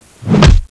slap fx

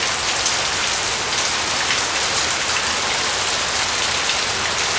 ran loop; DAoC

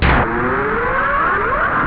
takeoff

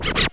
Scratch Pull; drums

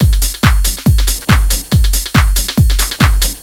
Phatt, Techno Drums; TechnoMusicMaker

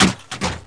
GUN HIT; Duke Nukem 3D Kill-A-Ton Collection

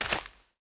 walk dirt; MOTS

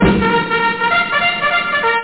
TRUMPETS

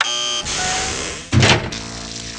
panel drp

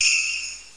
bell; NetHack

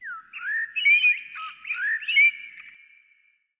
Forest bird; ImpGal2; colony

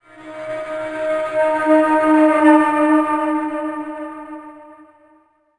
scrap hulk

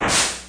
fire; riptide

